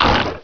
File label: melee hit, fish; HereticII; Heretic II Demo